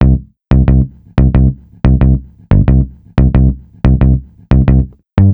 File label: Pick; Бас сэмплы (Легкая музыка)